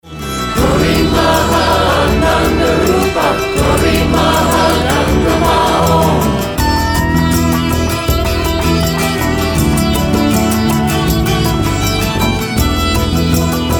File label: Shivaprita Groove, Cosmic Kirtan